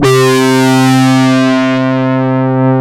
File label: MATRIX 12, OB Synths